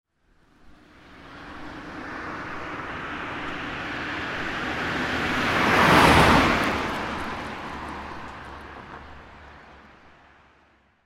Car: pass by from right on asphalt road, subtle leaves blowing when car passes; Cars